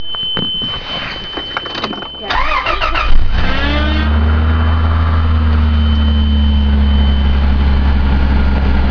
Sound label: startup zing